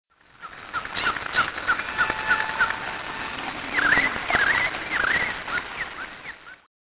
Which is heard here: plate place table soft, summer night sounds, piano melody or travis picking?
summer night sounds